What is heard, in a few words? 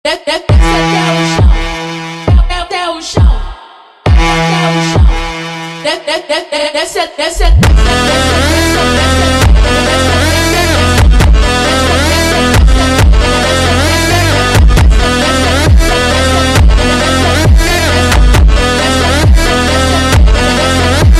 Funk slowed